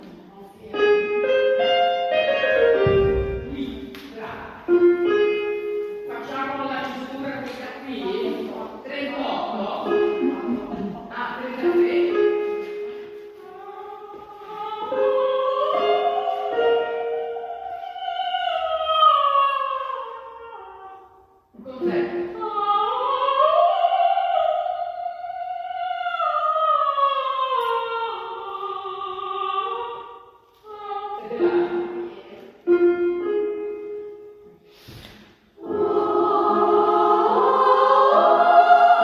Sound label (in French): Ave Maria de Caccini soprani; final